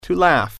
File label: laugh